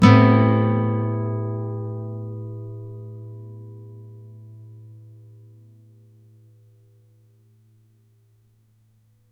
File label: clean acoustic guitar; 10 second